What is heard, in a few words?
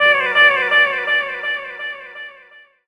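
Guitar, Chords Tones; Equipped Music; Loungin House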